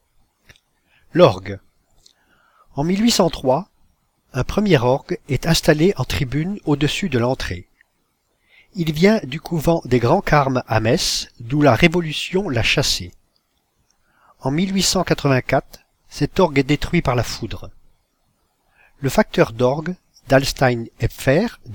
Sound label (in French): orgue